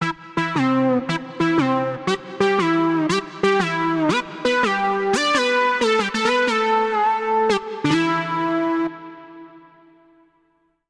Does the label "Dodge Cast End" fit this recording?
No